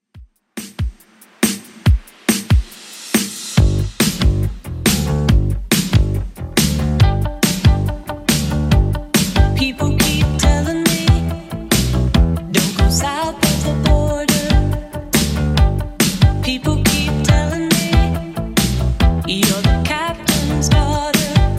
80s Redrum 140bpm

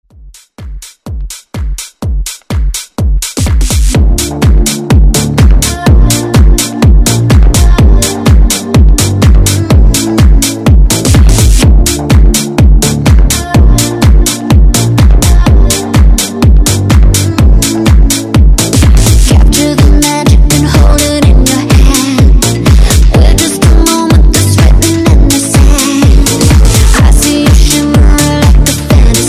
Dance Redrum

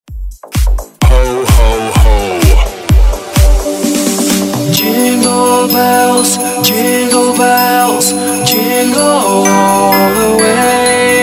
Xmas House; Redrum

